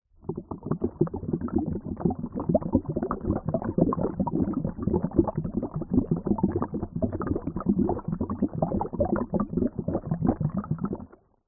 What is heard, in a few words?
bubbles underwater